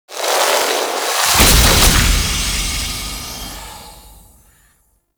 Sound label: Veilbreaker Jetpack Equip; Warframe; Vehicles